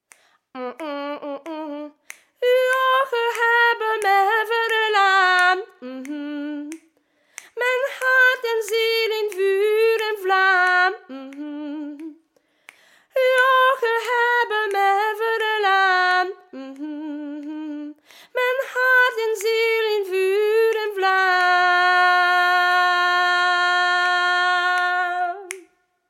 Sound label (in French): REFRAIN REPONSE VOIX